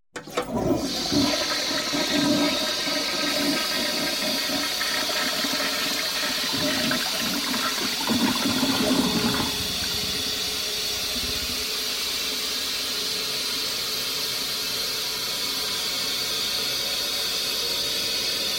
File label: toilet flush